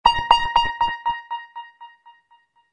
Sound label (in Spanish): tono mensaje